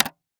Technology Electronic Portable DVD Player DVD Drive Closing